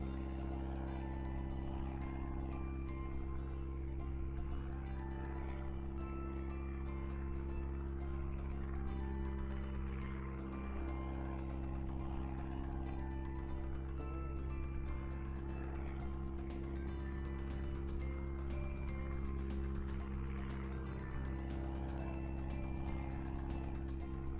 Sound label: music cheerful with plane engine rave; bgm